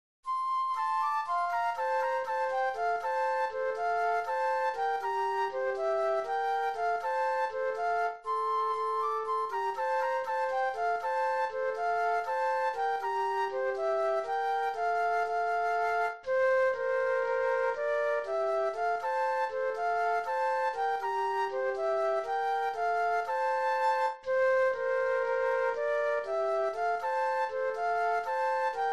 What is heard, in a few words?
Flute Duet; Mango Walk